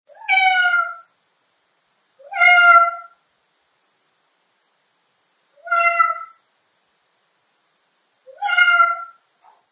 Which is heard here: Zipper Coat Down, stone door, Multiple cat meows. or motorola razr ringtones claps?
Multiple cat meows.